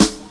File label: KIT, Dance Mega Drums, HIP; 90 s Sample CDs; Best Service